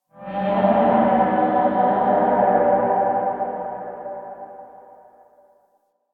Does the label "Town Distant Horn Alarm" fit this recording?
Yes